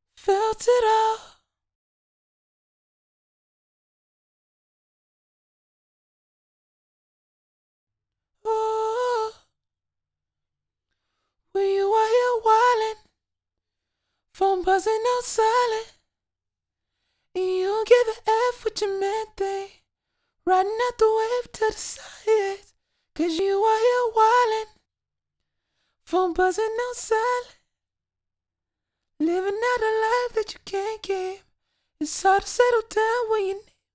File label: Raw vocals Backing Vocal; FL Studio